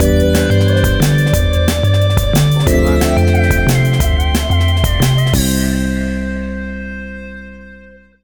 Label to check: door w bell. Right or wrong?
Wrong